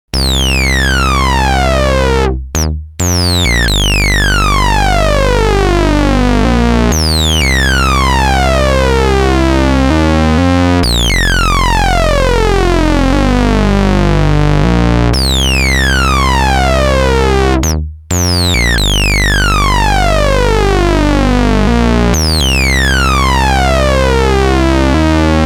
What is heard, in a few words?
A110 Sync Env